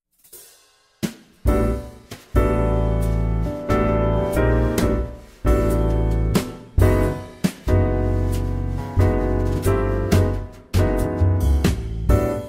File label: music christmas